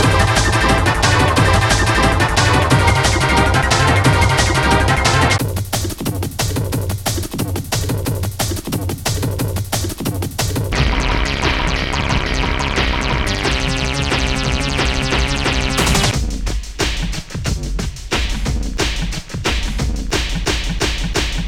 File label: Drum n Bass Resonance